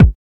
00s subtle reverb steel kick drum d sharp

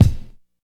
warm kickdrum sound c sharp key

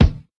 clear kick drum d sharp key; kick drum samples

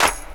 vinyl clap e key; percussion; single hits one shots

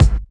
focused kick one shot g sharp key; kick drum samples; one shots

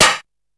crunchy snare sound d key; snare drum samples

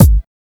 snappy bass drum single shot g sharp key

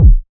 clean kick drum sound c sharp key; kick drum samples

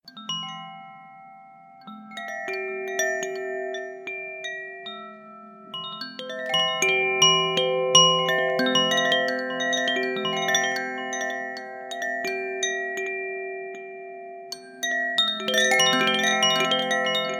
koshi wind chimes

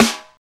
good snare sample a sharp key

